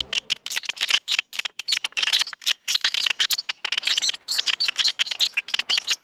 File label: wet chittering creature snaps and squeaks